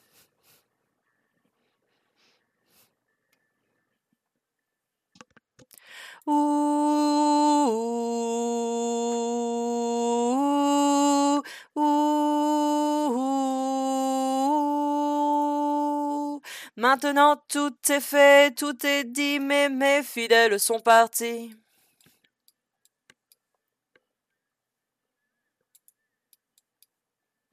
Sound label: tenor